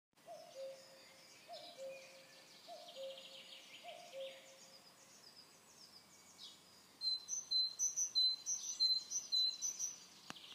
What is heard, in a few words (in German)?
Kuckuck